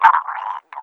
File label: gurp; terror